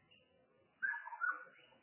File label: bird